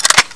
open, weapons; dod

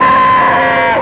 Pred Pain; svencoop; avp2pred

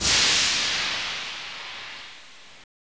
train steam

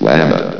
llama

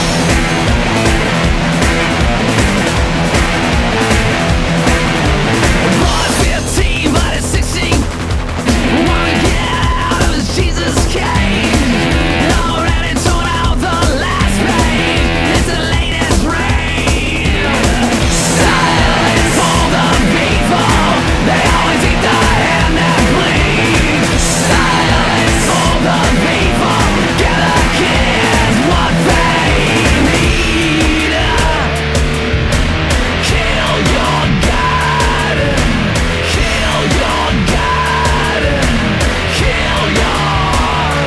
disco music; chaoscity

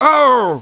pain; svencoop

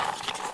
ammo

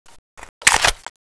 saw reload; svencoop

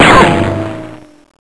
ric, debris

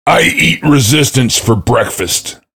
cheer; svencoop; voice commands; SeriousSam

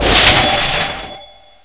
teleport; svencoop

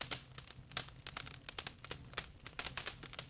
fire cracking

